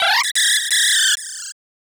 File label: Microphone Scream; Kirby Super Star